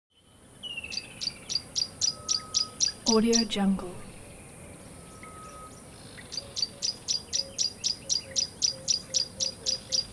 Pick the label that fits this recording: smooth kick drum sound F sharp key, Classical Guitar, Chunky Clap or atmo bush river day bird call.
atmo bush river day bird call